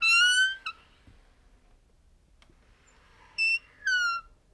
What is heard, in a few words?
Door Squeak Medium; Home & Office